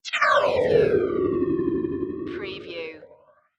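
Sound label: Production Element Chopper; Sound Effects Bible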